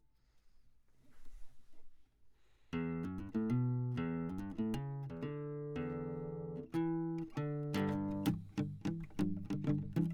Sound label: Classic Guitar